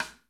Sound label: FUSION KIT; Northstar - New Gold VOL-1